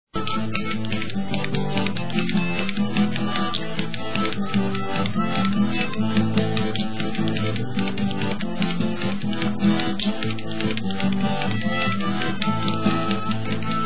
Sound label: polka